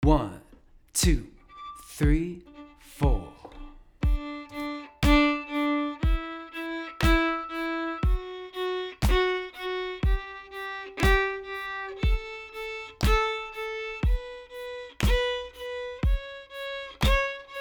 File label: offbeat accents train d major, fiddle; offbeat accent patterns